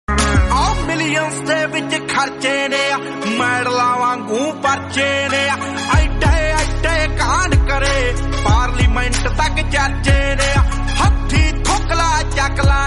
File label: song